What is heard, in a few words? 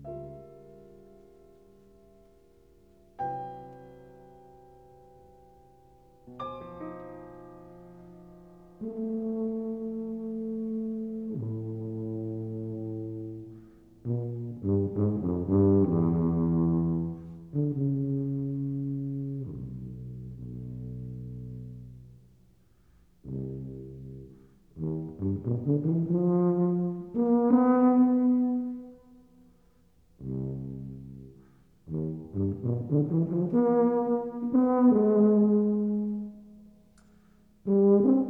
Sonata for Bass Tuba and Piano Lento; Hindemith